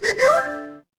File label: ui alert